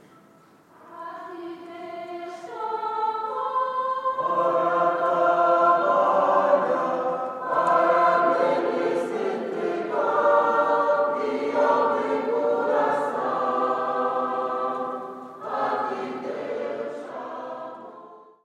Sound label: Windhoek Catholic church choir